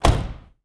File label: Car Door Close